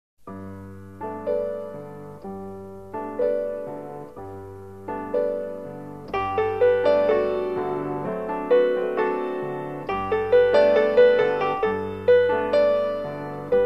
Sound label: Piano Orchesta; Sai Yoke; Soy Saeng Daeng